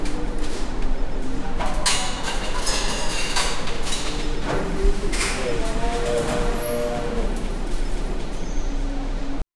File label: busy warehouse with forklift